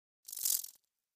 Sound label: Insect Clicks, beetles